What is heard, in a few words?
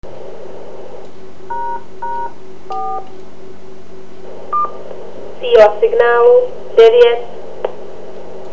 air DTMF cmd cross cross; síla signálu v S-metru za kolik Vás převaděč bere; OK0BEZ; 70cm RPT; je v ňom cejchovaný A/D převodník